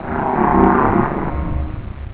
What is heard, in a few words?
pain, monsters; AoMDC